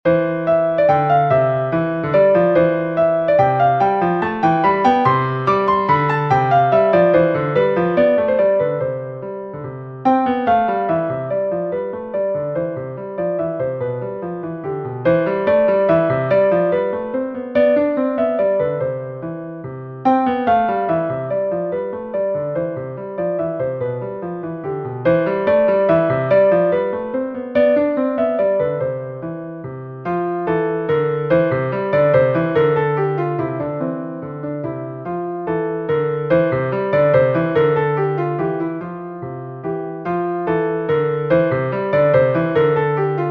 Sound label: Minuets for the Piano